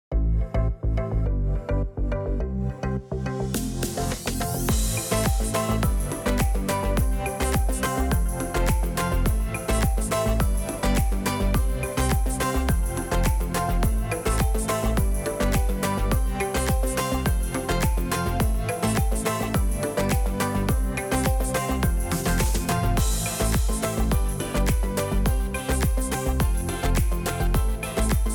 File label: background music tropical house funny dance short music